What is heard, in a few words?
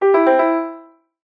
multimedia notification tone piano soft alert; zapsplat